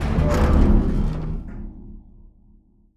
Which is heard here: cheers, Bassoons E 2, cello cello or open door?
open door